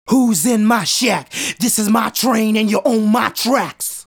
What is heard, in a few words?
Male Solo, Vocal